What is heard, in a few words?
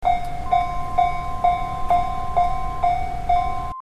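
humikiri, railroad crossing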